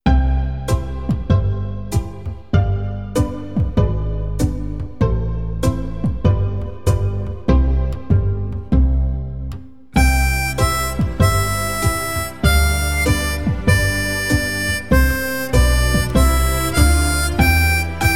harmonica; blues harmonica

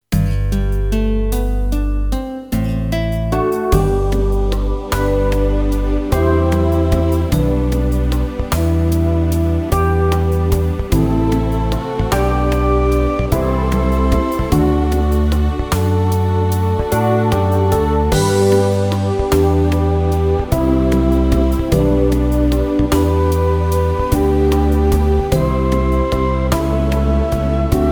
Violin to play along; Jesus joy

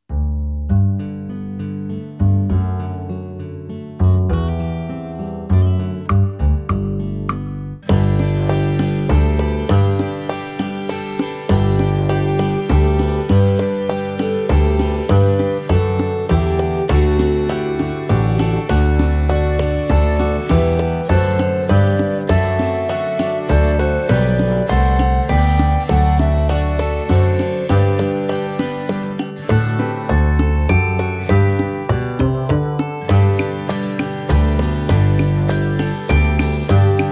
Flute

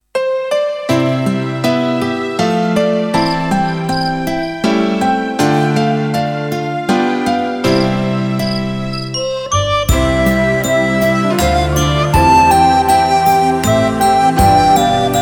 recorder

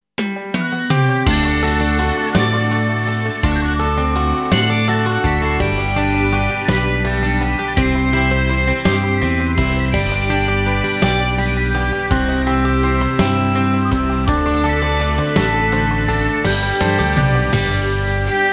Violin

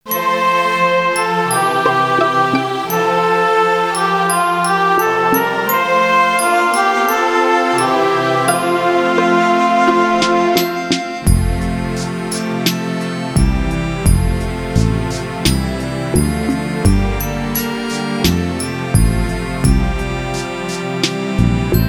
Trombone to play along; Die Blumelein sie schlafen

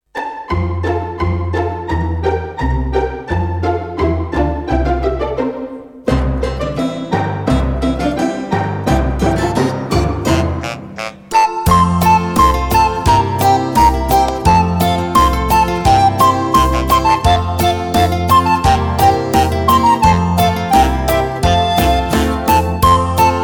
Ein Vogel wollte Hochzeit machen, recorder

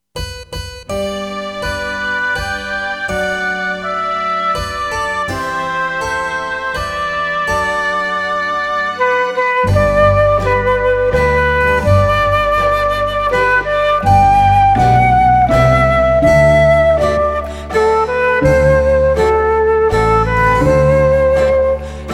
Flute to listen; Guten Abend gut Nacht

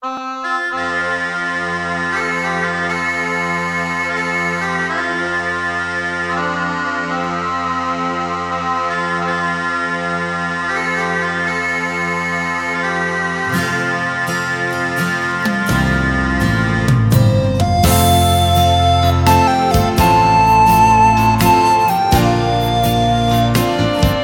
Amazing Grace Listening, recorder

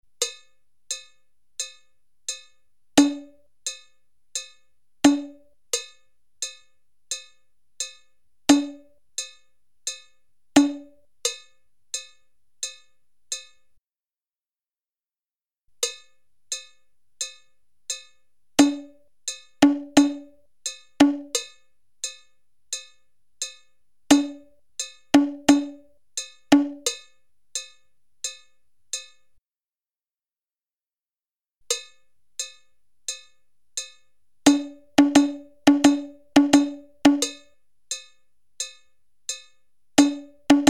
Bongos Dotted notes

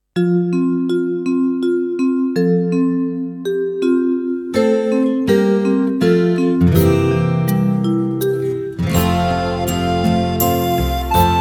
Wenn ich ein Voglein war, recorder

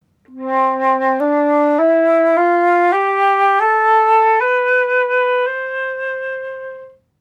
Flute The C major scale

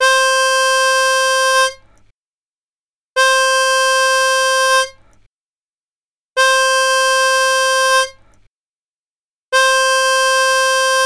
Harmonica C tone; blues harmonica

